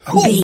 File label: voice male attack